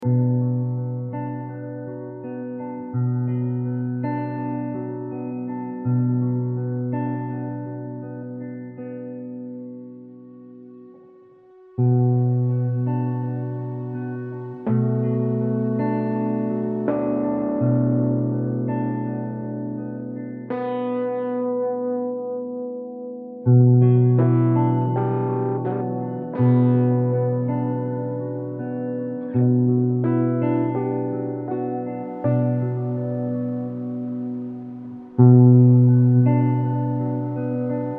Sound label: Guitar and Bass Harmonica Loop